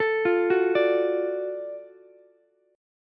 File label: Gb Minor Major 7th Sharp 5th, Ukulele, chord; arpeggiated; JGuitar